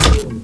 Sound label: ARROW DN; mtm2trial